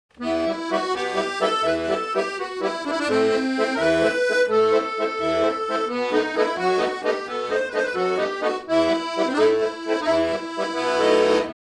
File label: akor ortf rode nt5; akordeon ORTF; Test mikrofon